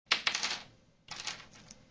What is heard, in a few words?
tinkle, tavern; AMBIENT; Pirates Of The Caribbean